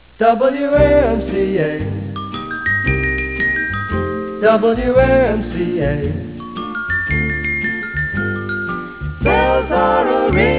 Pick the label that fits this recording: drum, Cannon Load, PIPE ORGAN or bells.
bells